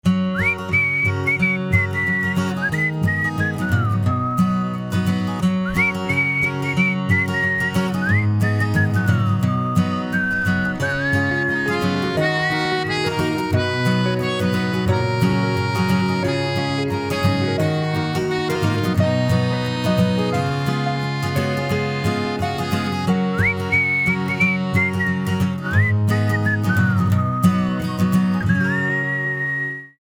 Can You Whistle

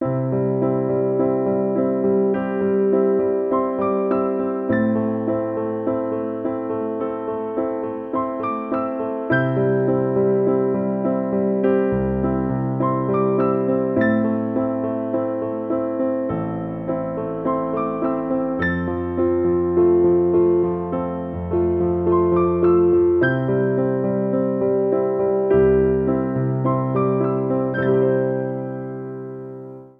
solo piano; piano poems b

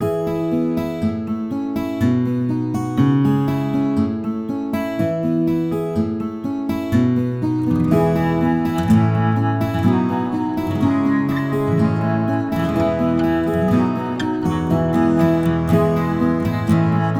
Folkiness no cello

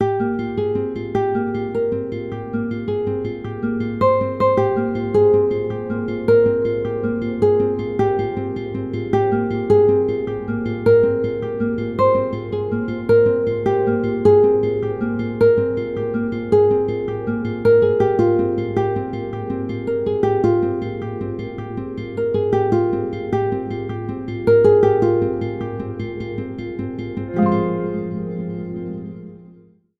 no cello

